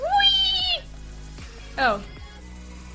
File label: weeeoh